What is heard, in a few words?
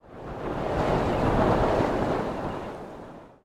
wind; adelia The Fortune Wielder; Foxium